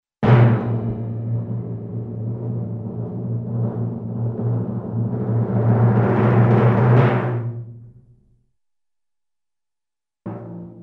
Long Tension suspense Type Timpani drum Roll, cartoon; Hollywood Edge; Cartoon Trax